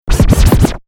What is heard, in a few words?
Turntable Scratching; DJ (Sound Effects:Musical); Lynne Music